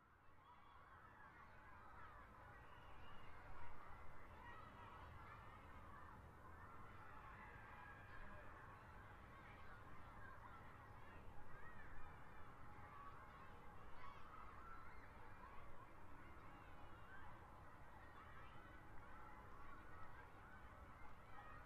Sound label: Room Tone Open Window, air fill